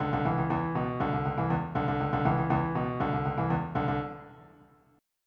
Funk

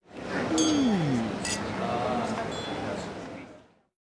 MUSEUM, buildings

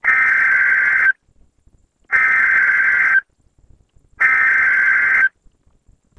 Warning; Weather Watcher Live 6.1.23

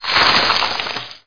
freeze; MOTS

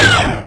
Laser; PC Gamer IT CD 48; Big bang

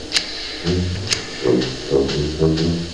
Tuba; MEISJE

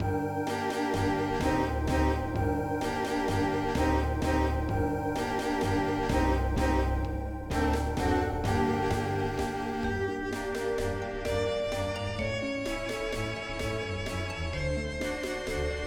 caves

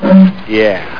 uuu yeah

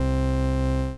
string

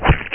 bulit hit; duke3d

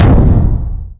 drum hit

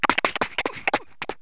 Model T Engine Crank, Cartoon; KABOOM! - Wild and Wacky Sound Software; Autos

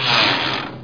pickup

